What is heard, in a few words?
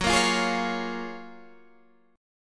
Gb Major 9th, down, Guitar, Drop D